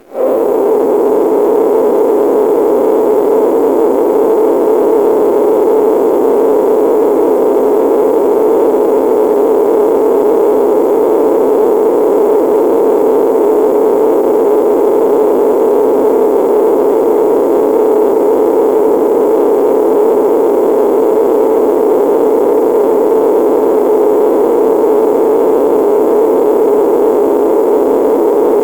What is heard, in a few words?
horror